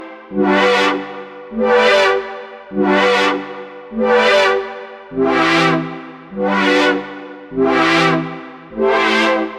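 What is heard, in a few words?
100 G major Synth Off Sweeps; Zero-G; Future Wave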